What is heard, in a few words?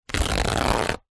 kitchen towel paper rip perforated; foley